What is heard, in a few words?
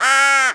squawk call, crow; critters